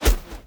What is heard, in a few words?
reels stop; Fruit Fiesta; Wazdan